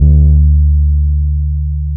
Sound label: D 3, hood boom, bass; Spectrasonics; Bass Legends CD 1